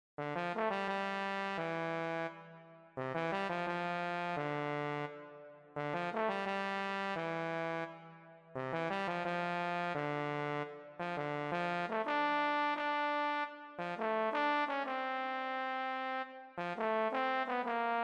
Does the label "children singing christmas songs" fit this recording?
No